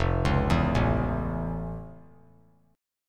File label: F Augmented Major 7th F; F+M7 arpeggiated; 5,8,7,7; JGuitar